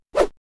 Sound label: Swoosh; Dino Donkey Dash; Swoosh Sign